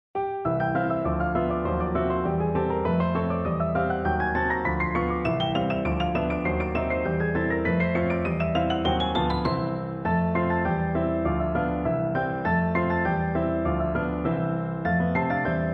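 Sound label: quatre mains, piano duet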